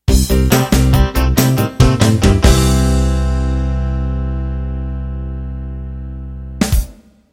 Rock Boogie End

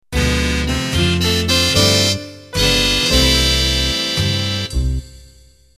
Band End

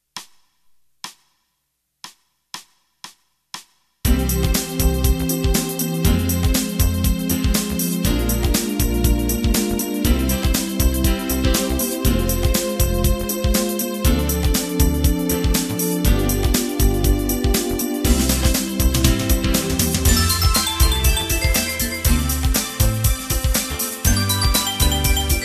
Fusion; smooth jazz, pop and jazzy rock styles featuring Synth Bass, E.Piano, Warm Pad, and Strings; Fusion 5 x & Fusion 5y

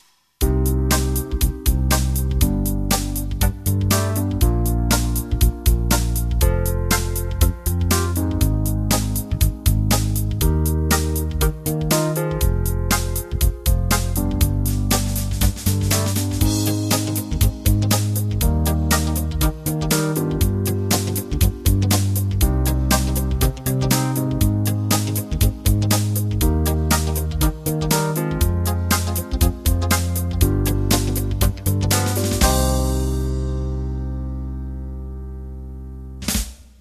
Fusion

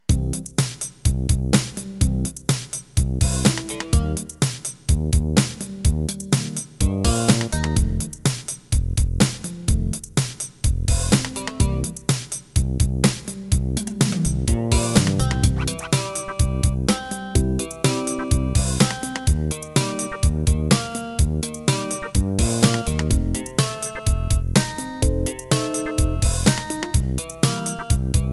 HH Soul; Bass, Rhodes Piano, Room Drum Kit (change to Jazz kit for smooth jazz), Clean Electric Guitar and Rock Organ; HipHop Soul

